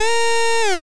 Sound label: air horn